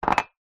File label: mug cup ceramic set down kitchen worktop